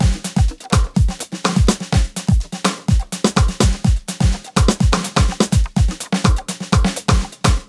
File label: Rimmed, Beats, Nu Jazz House, 125